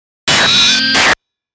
Hiss Static Shine; Hiss Static Shine Glitch Production Element Imaging Element Accent Transition; 5.1 STEREO MIX; Blastwave

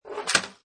Pinball Sound Roll Into Hole Or Gate; Video Games; Sound Effects:Games; Lynne Music

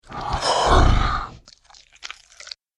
Dinosaur Feeding; Dinosaur Sound Effects; Monster Sound Effect

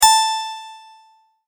multimedia tone correct; Tones; Sound Design; McKinney Sound

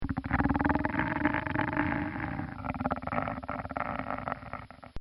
Monster Purr; Monsters; Horror; recorded in a custom built sound booth

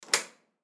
Ship Door Small Lock; Transportation Watercraft; Boat Sound Effects; Blastwave

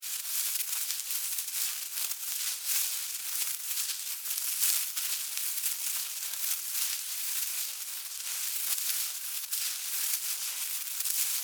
Animal, Critter, Movement, Grass, Foliage; Flies; BlastWave

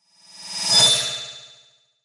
Flash Whoosh; Hits; Sound Design; Blast Wave

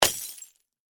Warfare, Bullet, Impact, Glass; Impacts (Sound Effects:Combat)

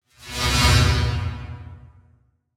Whoosh Robotic Flange; Blastwave

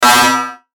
Hit Robotic Digital, Lfe Hit Low Bass Impact; Sound Design; Blastwave

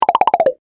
Bubbles Blips, Cartoon; Cartoon Sound Design; BlastWave

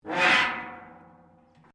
METAL RUSTY SCRAPE

